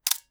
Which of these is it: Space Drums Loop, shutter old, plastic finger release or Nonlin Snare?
shutter old